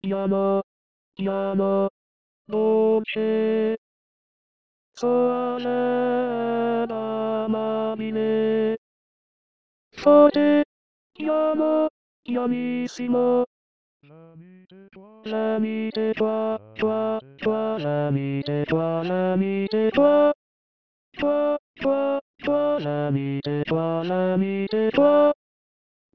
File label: tenor